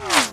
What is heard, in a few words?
bullet L to R, near miss; weapons; Garry's Mod; hl2